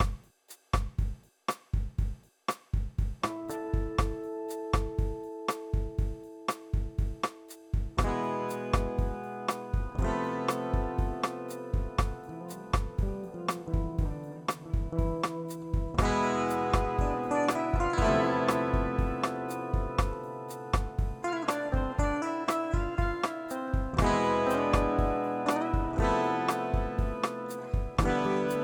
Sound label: Zoom G11 Bossa